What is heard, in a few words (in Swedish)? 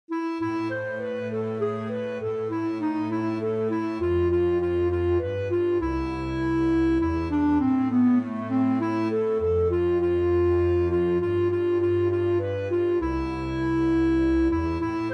Polska